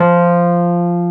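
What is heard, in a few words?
Wurlitzer F3, Keyboards Old School; USB Soundscan vol.09 - Keyboards Old School; AKAI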